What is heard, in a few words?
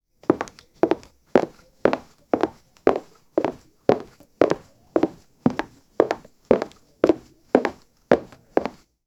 the sound of louboutin hi, fashion